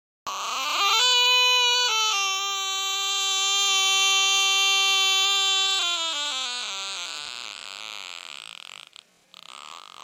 baby autotune slow

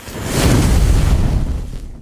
burning fire

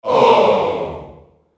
audience disappointed ooh aww

